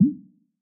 reel bounce; berry burst

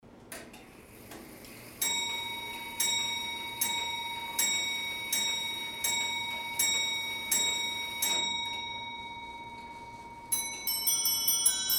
clock

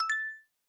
success

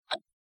tic toc

